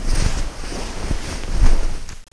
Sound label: bed